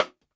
DUB RIM, DUB SNARES; Drumdrops In Dub VOL-1; SINGLE HITS

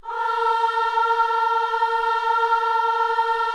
KIDS CHOIR, Gospel; Spectrasonics; Vocal Planet

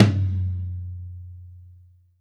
Tom, Live Kit; Sonic Foundry (Sony Creative Software); Ugly Remnants VOL-2; Single Shots; Beatstuff

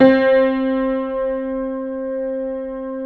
Keyboards Acoustic, C3; USB Soundscan vol.17; AKAI

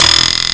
spring; ST-XX Amiga Soundtracker Sample Packs

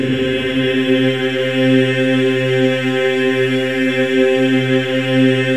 C4, Symphony of Voices men; MEN EES OCT

